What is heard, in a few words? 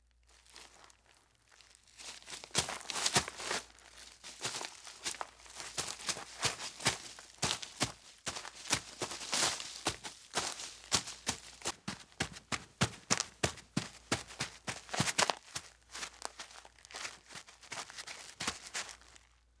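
Footsteps Tramping Thru Leaves; Fairlight Sound Lib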